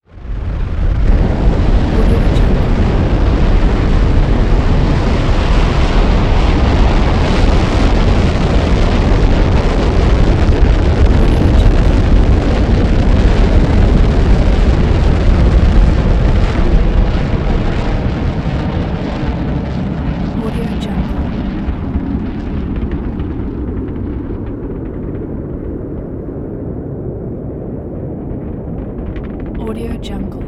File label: rocket launch